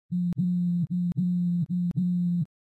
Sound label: steering vibration